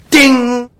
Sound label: ding